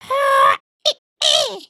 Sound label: robot dance, emotes